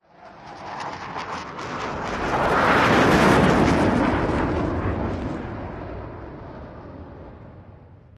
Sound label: bonus stopper jets; Recode; rampage riches Desktop